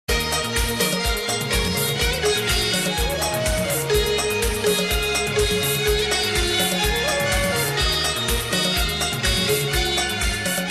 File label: romantic ringer